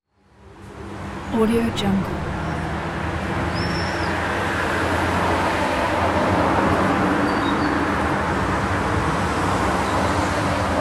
city traffic and ambience; chicasound

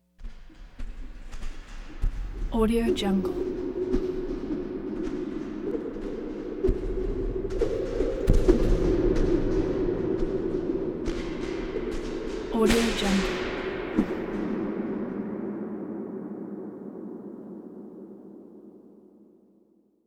tension wing whooshes chaotic

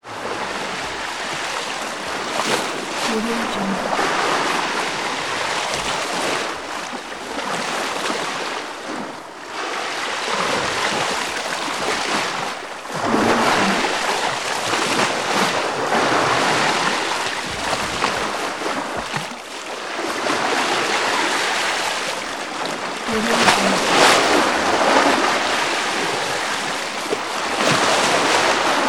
water movement sloshing splashing bow wash

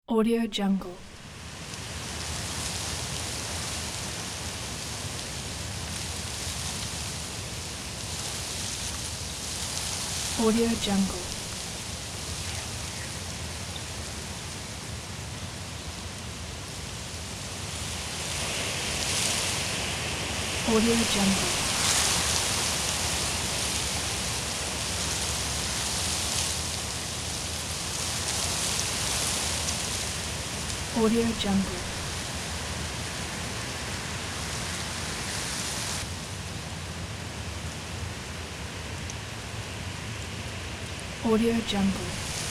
wind in trees

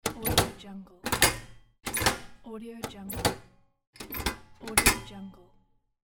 metal mechanism; savataivanov

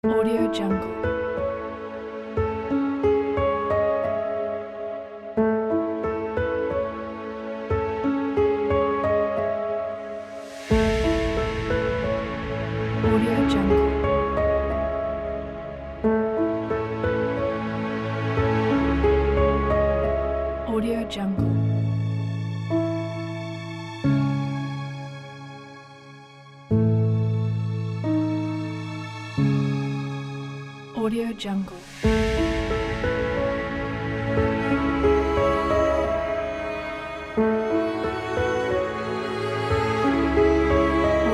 beautiful piano

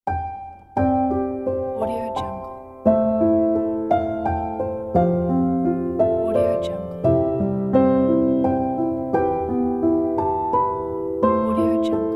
we gather together piano

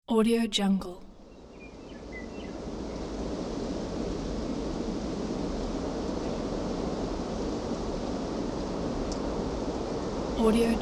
mountain ambience

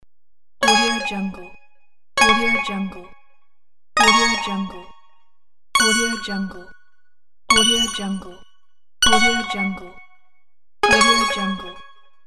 gambling sound

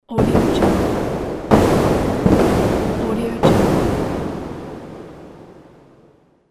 war game

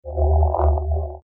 grumble by; sound ideas

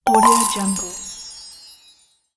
collect

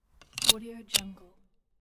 seatbelt

